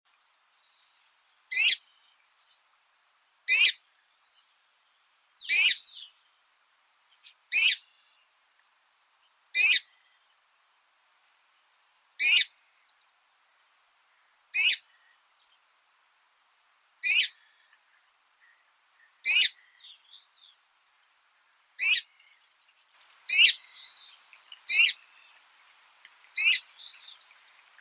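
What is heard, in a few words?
rosefinch, Carpodacus rhodochlamys; chechevitsa archovaya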